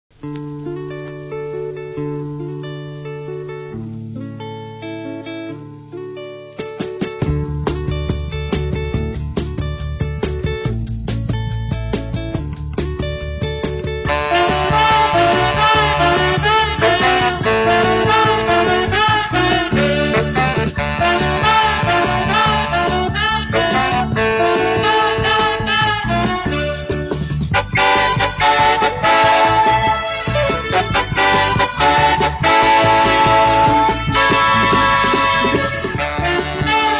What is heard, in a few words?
flute